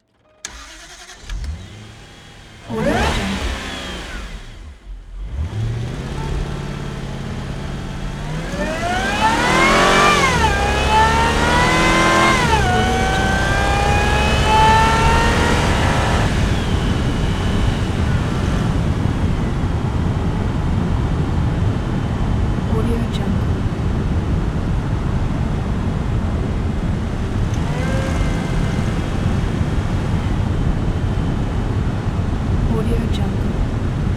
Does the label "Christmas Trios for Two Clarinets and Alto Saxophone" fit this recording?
No